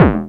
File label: Bdrum